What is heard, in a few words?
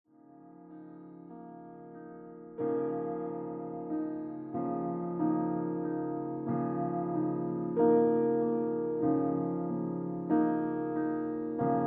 piano